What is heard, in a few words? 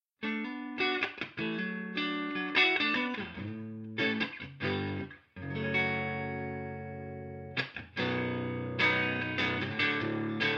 clean